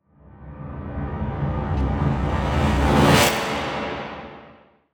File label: Riser; increased control studio